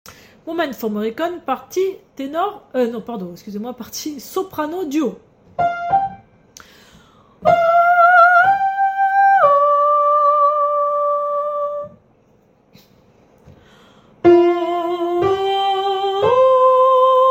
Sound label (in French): Soprano du haut